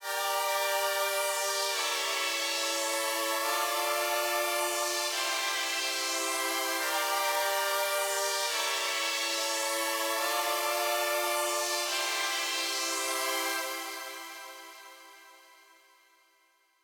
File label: PWM Kit 142BPM Verse - PWM Pad WET, Gmin; Vengeance - Essential Clubsounds Vol.5; Melody & Synth